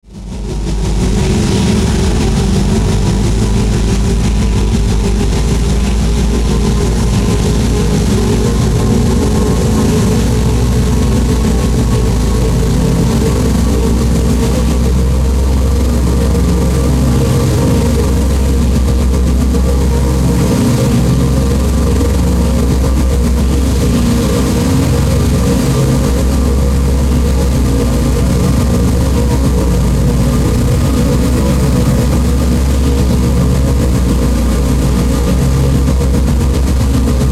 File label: Drone Synth Vibrato Static Warble; Blastwave